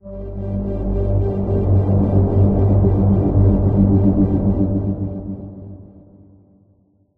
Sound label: Transition End; Everspace 2